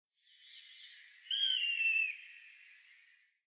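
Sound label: Eastern buzzard; nosuri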